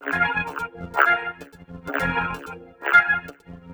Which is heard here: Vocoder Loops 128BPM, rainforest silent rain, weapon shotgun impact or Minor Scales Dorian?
Vocoder Loops 128BPM